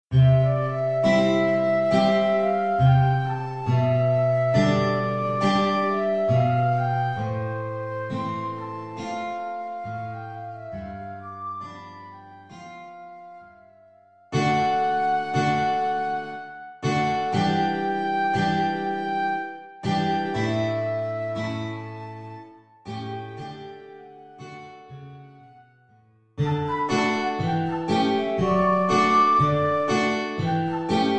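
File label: dialogue flute guitar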